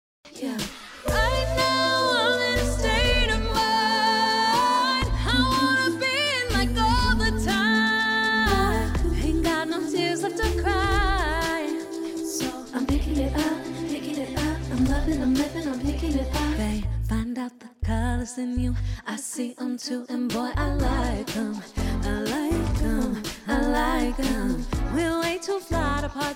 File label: Pop R&B